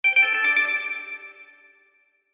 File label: Chime Musical, Chime Musical Multimedia; Web Interactive; Chimes (Sound Effects:Multimedia); Blastwave; Technology